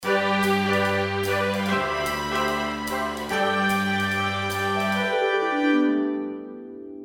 Hearts loading

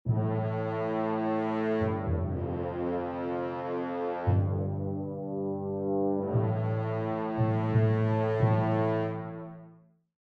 Tower Up Loss

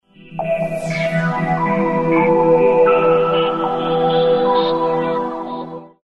Bandit Lose

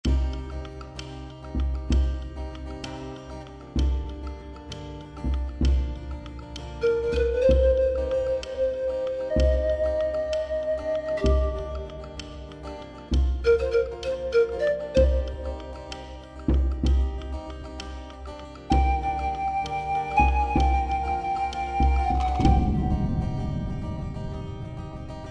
spider loop

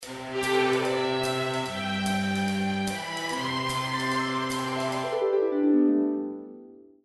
Hearts Game Complete

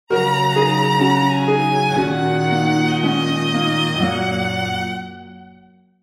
Mahjong Lose; Daniel Wolfe

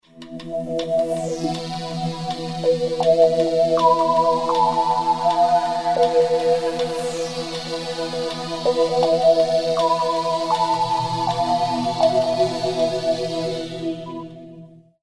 Bandit Splash

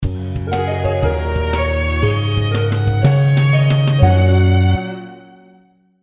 Mahjong Win; Daniel Wolfe

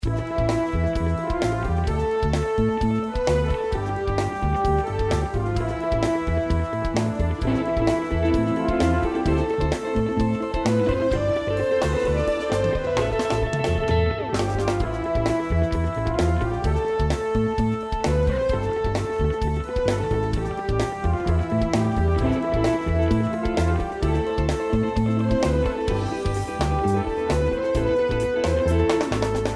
tower up loop

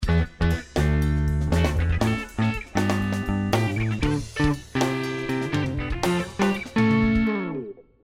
tower up project done

